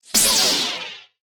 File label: lightsword turning on; soundscrate